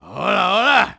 Grunt; Gonzo grunting at the ship's wheel

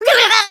Shock; Link getting shocked; zelda

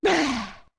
Zora Link Ahh; Zora Link refreshed after a drink

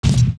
Fall Down Stone; Link

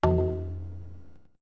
Notes Drums F long; Goron Drums (long)

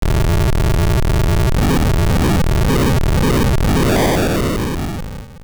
Column Fall; A column in Eagle's Tower crumbling and falling

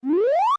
Pause Open; Open and close the pause menu

